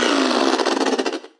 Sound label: Growl